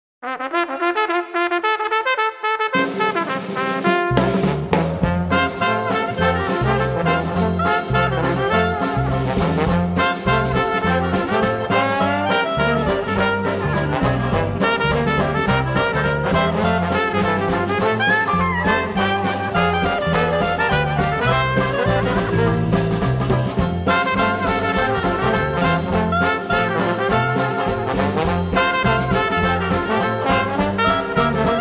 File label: Bugle